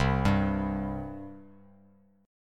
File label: C 5th, C, down; arpeggiated; chord; JGuitar